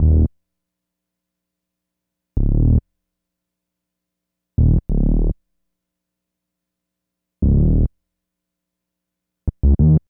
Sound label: HipHop Synthbass Ebmin; Digidesign; Pro Tools Essential Loops